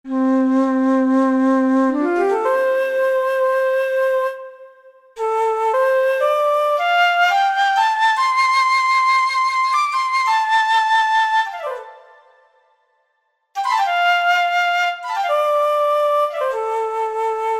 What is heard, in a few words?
Bamboo Flute; synthesizer; Guess the synthesizer game